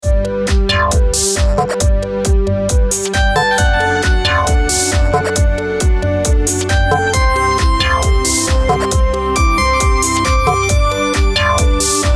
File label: Frequency Octaves